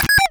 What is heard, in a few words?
Bleeps